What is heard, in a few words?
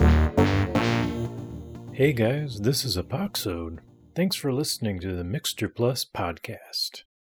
bumper music+words; MixterPlus